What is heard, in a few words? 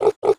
Pig Normal